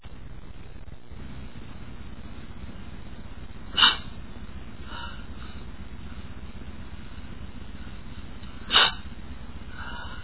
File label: stifle, male, achoo